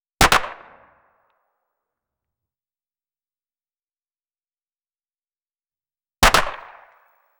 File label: shotgun racking a single